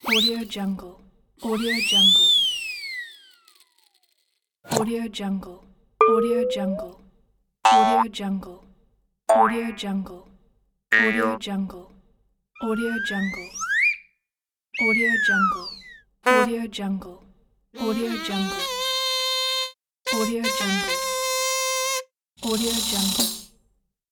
funny cartoon

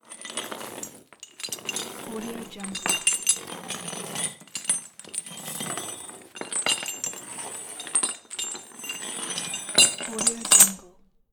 metal chain dragging and set down; soundjay

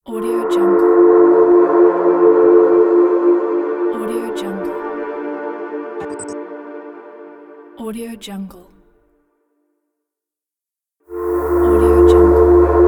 smoke logo

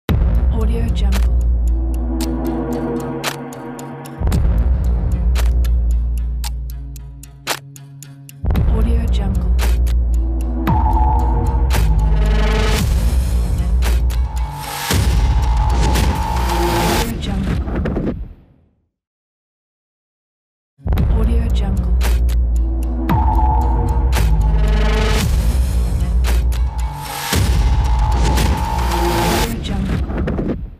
hybrid aggressive cinematic trailer logo; musicdog

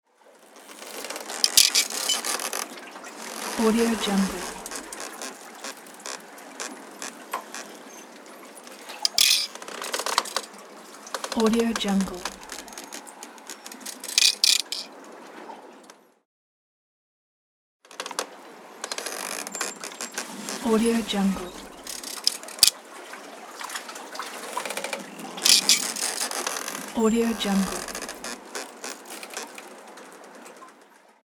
rope creaking

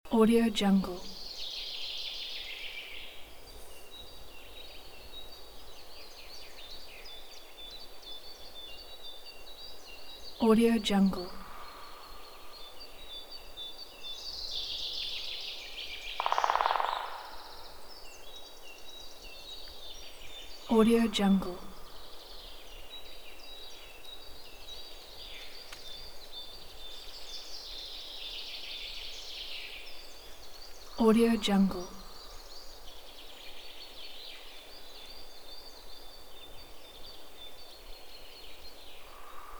spring forest with woodpeckers

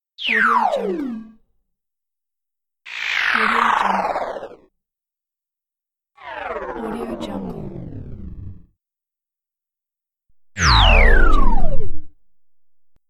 shutdowns

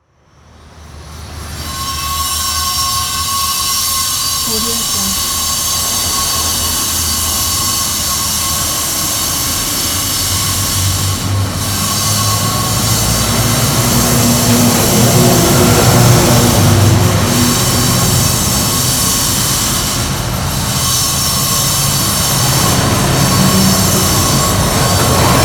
urban construction site ambience

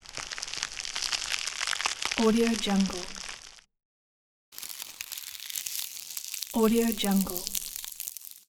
freezing

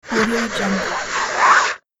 parachute cloth slide

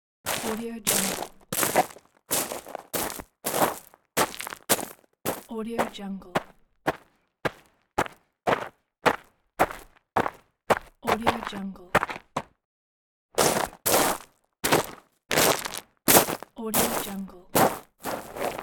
walking on rocks

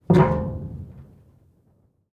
metal hatch

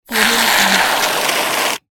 whipped cream; kazoomx

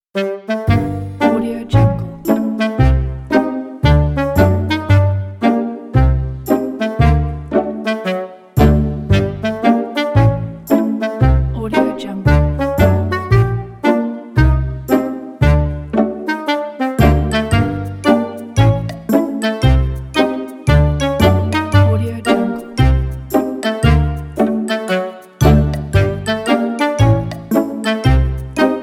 quirky comedy background

